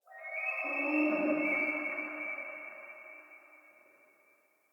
Stag Stampede Vocal Grunts

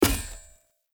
Short Sword Throw Medium Impact Wood Hollow Large; Player

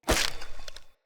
Foley Melee Swing; Enemy; Characters; Corrupted Sproutfolk

